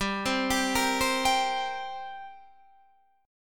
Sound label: G Minor Double Flat 5th, G, Guitar, DADGAD